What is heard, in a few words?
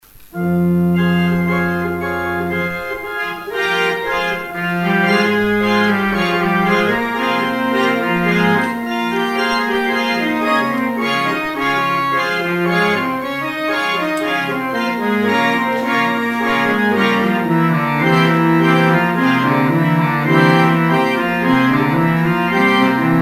organ outro; Organ exit music (Postlude - Leon Boellmann); St. Mungo's